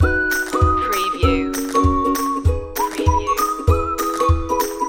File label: happy folk loop